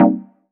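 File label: Cm7 chord; Klassy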